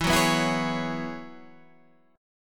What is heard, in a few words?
F sharp 7th Suspended 2nd Suspended 4th, E